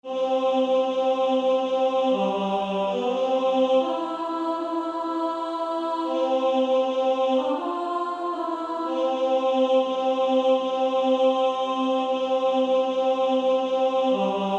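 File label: Female Choir, alto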